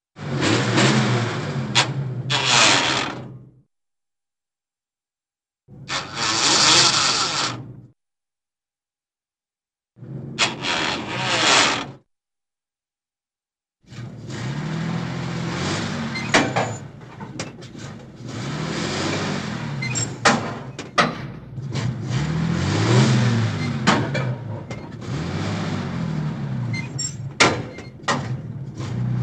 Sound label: Gear Grinds - 1946 Dodge Truck Delivery Truck Parall; Cartoon; Hollywood Edge; Cartoon Trax